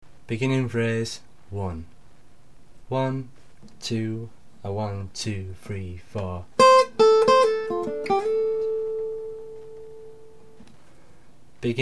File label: Beginning Phrases, guitar